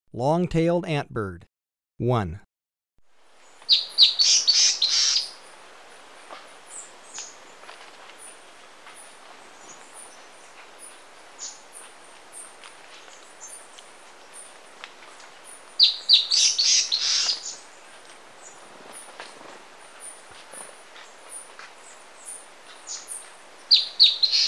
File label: Long-tailed Antbird; S. America